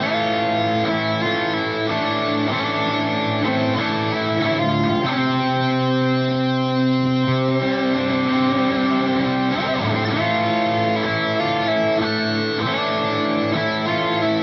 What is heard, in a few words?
Amp Lead; Boss GT-1000 Core